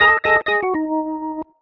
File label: ORGN 123, Keys; Houseworx